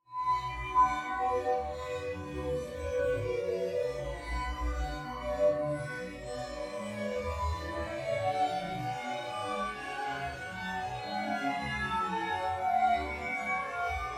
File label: Badinerie with Spectral Emergence; SOUNDMAGIC SPECTRAL; Michael Norris